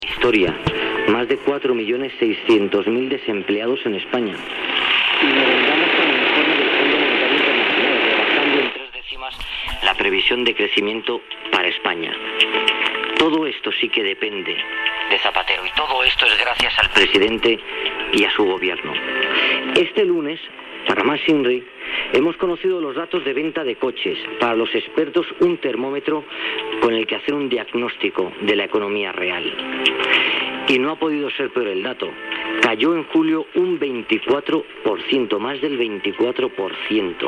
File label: spanish radio surf